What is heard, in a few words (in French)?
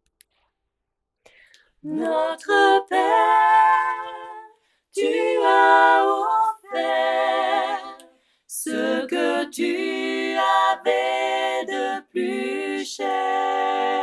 voix soprano